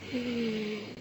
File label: Breath; Lewd SFX